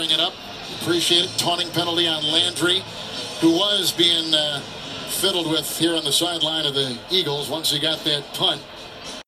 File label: Fiddle